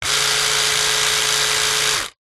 household razor electric remington male trimmer operate run dry short burst